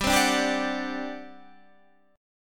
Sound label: G Major 7th Suspended 4th, G, down, Guitar, Standard; GM7sus4 strummed; JGuitar